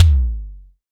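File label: SDS V BD, bass drum; Best Service; Real Mega Drums VOL-1; MONO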